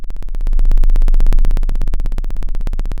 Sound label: Sound of an engine revving